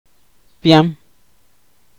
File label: sound of an automatic horn; ibibio